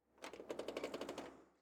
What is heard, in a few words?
door open; Golden Crayon Sounds